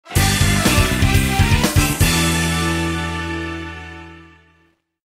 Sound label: Match Start; MLB Power Pros